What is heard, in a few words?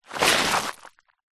foley shoe scrape fast gravel stones on road or path